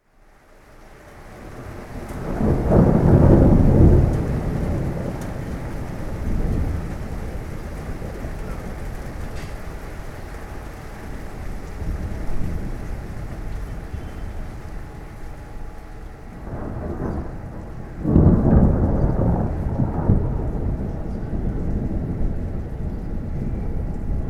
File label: Rain